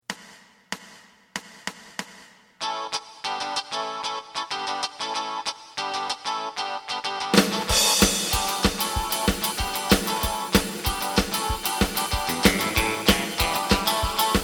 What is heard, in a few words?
bass